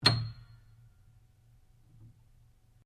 PIANO UNTUNED C7 F, Baby grand piano left to fall out of tune; Pianos (Sound Effects:Musical)